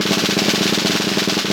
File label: mil roll; Greytsounds; Scott Peers Sound Engineering